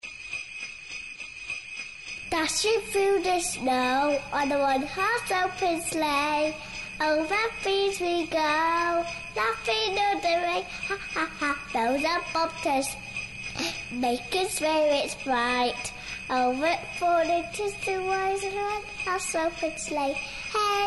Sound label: jingle bells